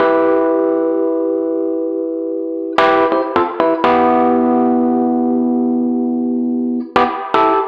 Dirty EP, disco house dirty electro piano; сэмплы клавишных

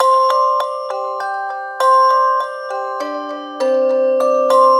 Pop bells

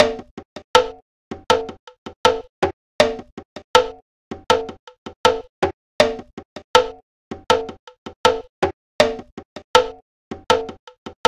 Eastern Percussion 80 BPM; восточные барабаны

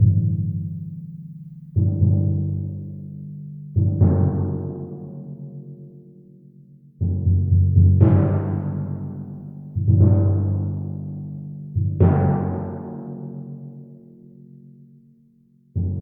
timpani; звук для драматической сцены литавры

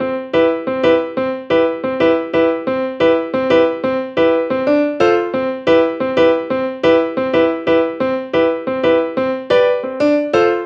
Easy Life, keys

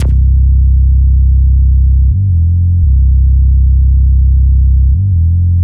Drill Grinder

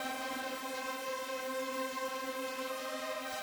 Big Lead High

